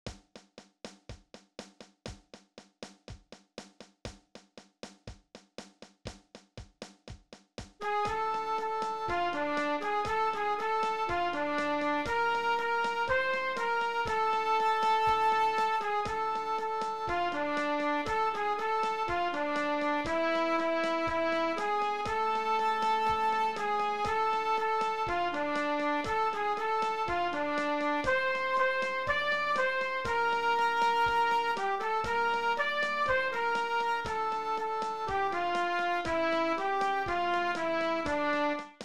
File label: Flute Klar Trp